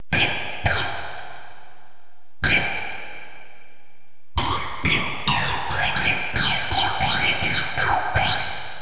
scifi

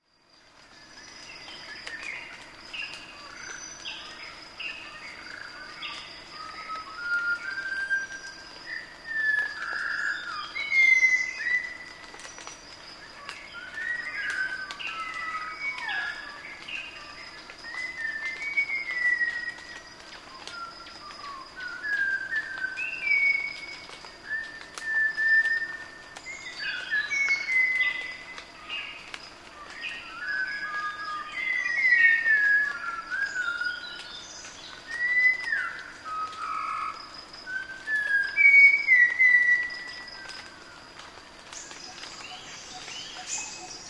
sunbird forest

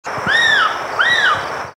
ninox punctulata

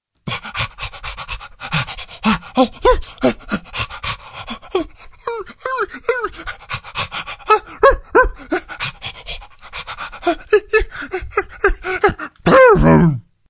dog talk

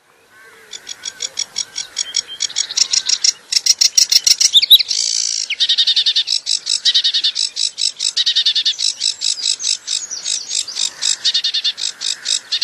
sedge warbler

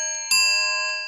musical

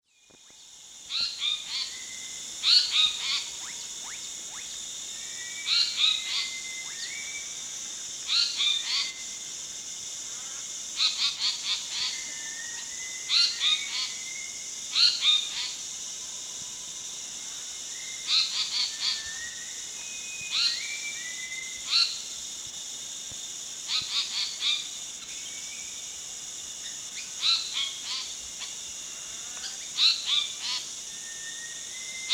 asian paradise flycatcher calls song

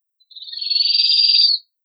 ninox connivens

